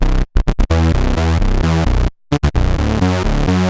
cbd, edm bass

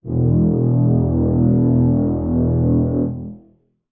brass acoustic, muted trumpet

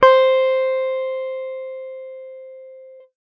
guitar electronic, clean electric guitar

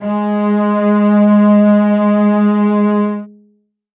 string acoustic, cello

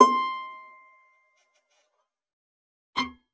bass acoustic

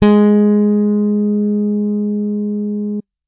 bass electronic, electric bass finger

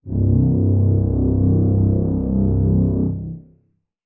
brass acoustic, muted trumpet

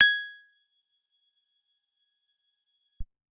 guitar acoustic, acoustic guitar nylon

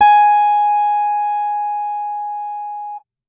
bass electronic, electric bass finger

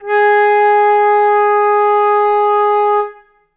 flute acoustic

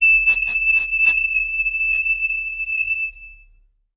reed acoustic, clarinet

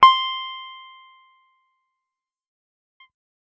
guitar electronic, clean electric guitar

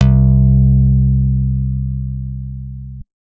guitar acoustic, acoustic guitar nylon

